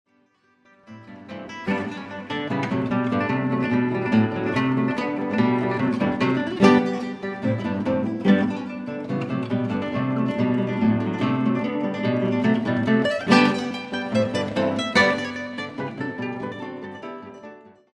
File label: Russian Dance